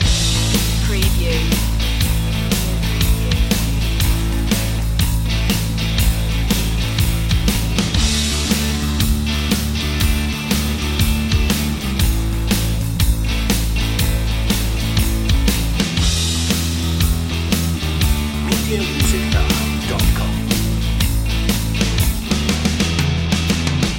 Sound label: blues loop